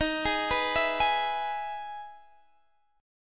E Major 7th D sharp, chord

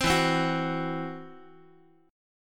E Suspended 2nd Sharp 5th E, down, Guitar; Esus2#5 strummed; x,x,3,2,4,5

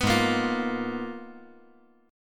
Ab Minor 6th Add 9th, Ab; strummed; JGuitar

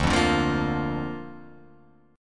D Minor Sharp 5th D down Guitar Open F; Dm#5 strummed; JGuitar

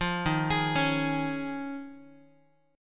D sharp 7th Suspended 2nd Suspended 4th, Guitar; arpeggiated; 8,11,11,11,x,x; 4A,4E,5A,5D,5G#,6C#; D#7sus2sus4; JGuitar

